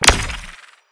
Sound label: gavel